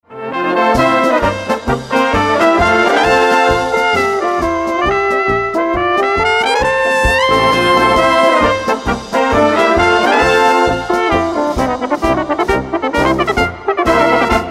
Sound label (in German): Blaskapelle